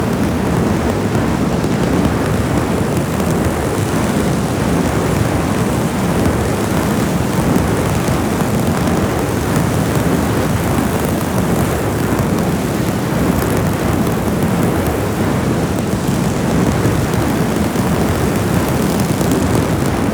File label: Fire Shot Loop; Warframe; Lizzie